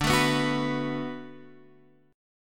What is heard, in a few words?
D 6th D down Guitar Open F, chord; D6 strummed